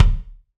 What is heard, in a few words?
BD, BASS DRUMS; EdgeSounds